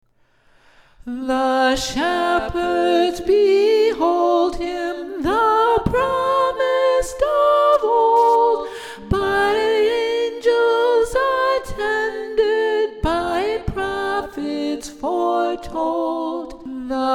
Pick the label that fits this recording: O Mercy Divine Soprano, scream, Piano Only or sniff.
O Mercy Divine Soprano